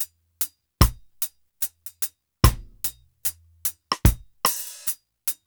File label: TING LOOP 74; AMG Gota Yashiki Groove Activator